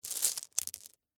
Marbles Pick Up, Texture, Marbles, Pick, Up, Handful; Miscellaneous Toy; BlastWave